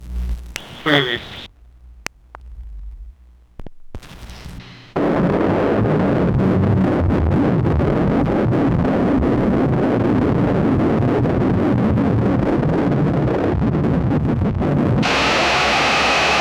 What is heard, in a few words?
sudden burst of heavy mec